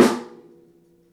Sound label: snake, snares real